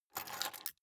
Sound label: key ring large grab table soft